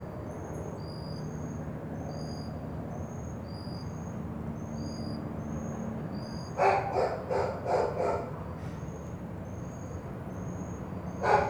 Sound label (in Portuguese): Ambiencia residencial goiania noite cachorro mais perto com grilo e aviao; ambiencia urbana